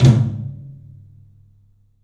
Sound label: PA TOM, 14 TOM; 3D Sound; 3D Drums; CLUB 12 TOM